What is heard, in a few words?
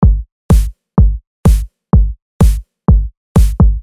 Beat Dissected Homework Beat